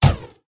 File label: cat attack contact bottom